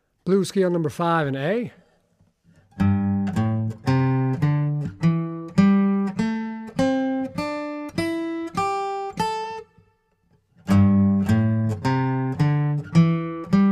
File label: Blues Scale in A; Blues Scale #5 and Chops in A